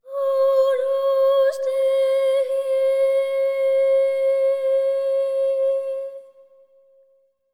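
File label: agnus boy, Symphony of Voices, boy phrase; Spectrasonics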